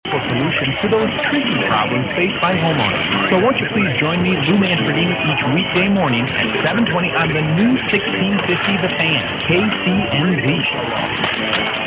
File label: 1600 also others, mw dxing; kcnz